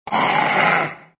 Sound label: zombie pain